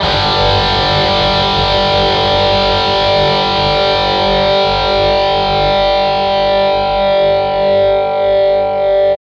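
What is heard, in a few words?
GUI MET2 G3, metal guitar; Sound & Vision; Gigapack I CD 2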